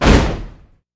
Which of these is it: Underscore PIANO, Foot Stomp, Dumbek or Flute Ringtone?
Foot Stomp